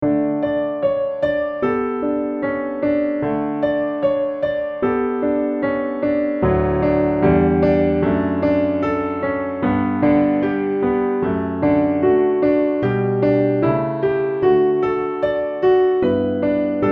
Twinkle Twinkle Piano Accompaniment Backing Track; ViolinSchool

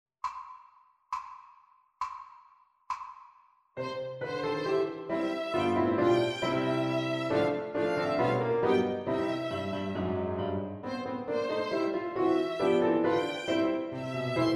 Backing Track; ViolinSchool

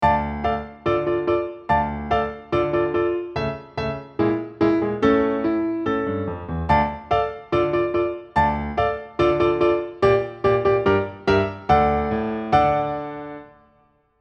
Accompaniment; ViolinSchool

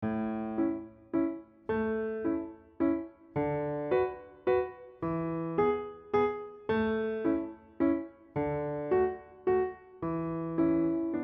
Accompaniment; Lazy Ländler; ViolinSchool

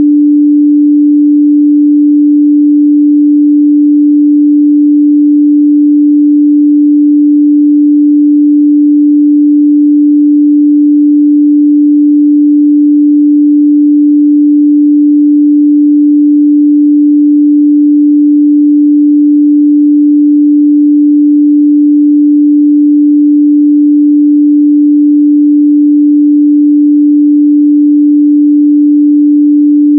Tone generator D4

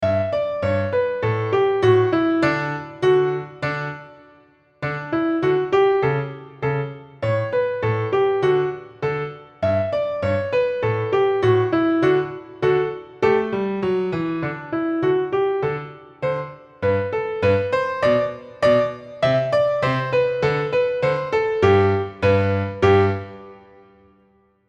50bpm, violin

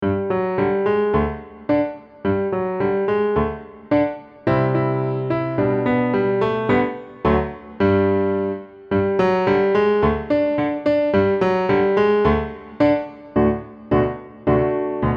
violin

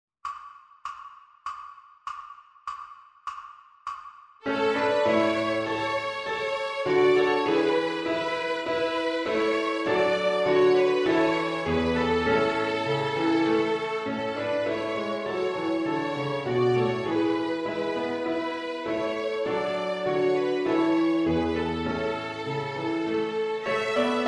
Violin Piano Backing Track; ViolinSchool

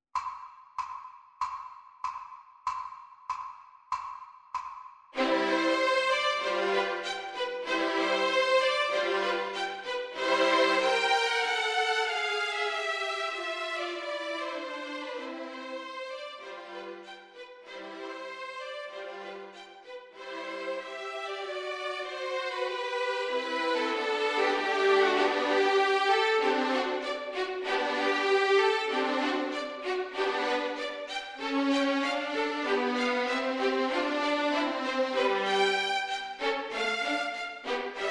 Study Both Parts, violin; Dancla Study No. 1; ViolinSchool

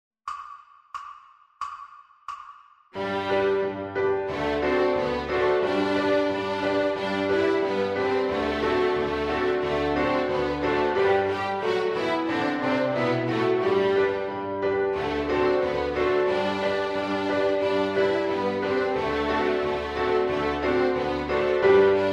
Violin Piano Backing Track; ViolinSchool

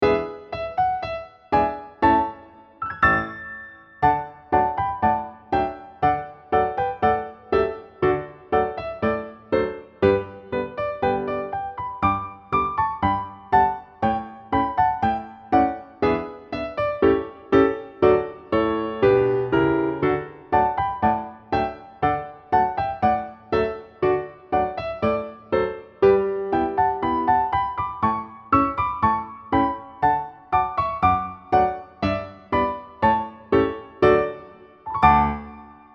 Piano Accompaniment Backing Track; ViolinSchool

